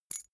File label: key ring small catch; objekte; schluessel